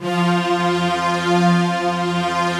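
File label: Chinese orchestra, Filter; Optical Media International; Sonic Images Library; Chino Filter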